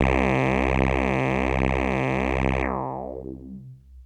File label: Techno leads, hits and riffs